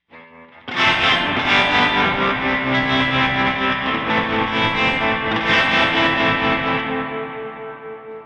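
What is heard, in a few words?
Spring Electric Guitar; Amespring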